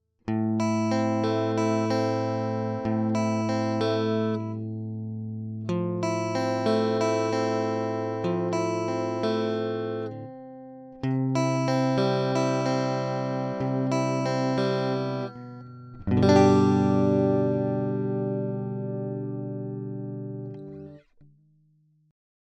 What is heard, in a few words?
Guitar Pure